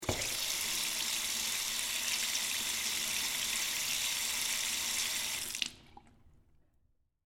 Sink Run Bathroom, Public; Office; Public Bathrooms; BlastWave

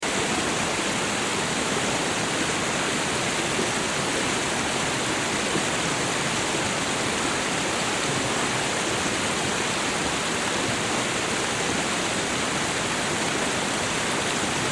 Waterfall River, Waterfall River Run Flow Loop; Nature; Blastwave

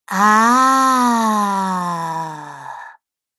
Woman Understanding Ah; Recorded Voice Sound Effects

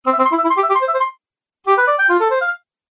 Fun Game Cartoon Oboe Sounds; Video Game Sound Effects

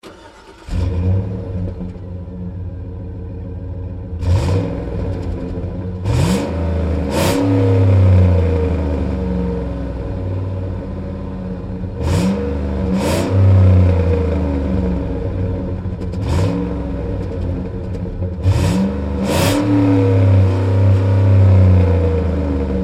Truck GMC Savana Tailpipe Start Revs Off Hard; 2010 GMC Savana; Trucks

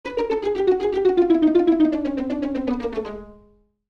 String Plucks, String Plucks Descend; String Plucks Descend Fast Long; Cartoon Music; Blastwave

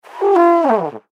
Cartoon Baritone Mid Note Short Slide Musical

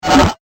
Dirty Rush Short Whoosh

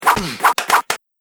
Kung Fu Fast Swish Slap Hits And Victim Grunts; Punch And Hit Sound Effects; Andrew Fleming

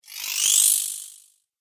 Warlock Magic, magic; Magic Sound Effects; Role Playing Game; Andrew Fleming

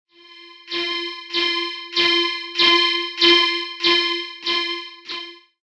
Red Alert, alarm; Alarm Sound Effects; star wars / trek style

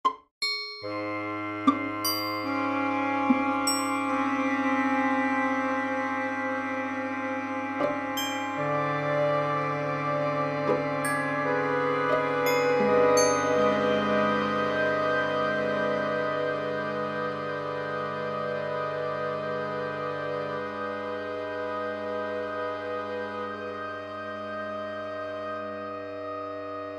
Orchestral, Orchestral Light Eerie Melody; Musical; Blastwave

